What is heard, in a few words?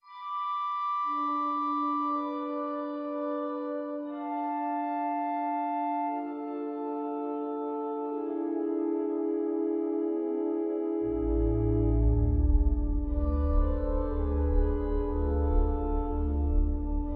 Little Fugue in D Ukrainian Dorian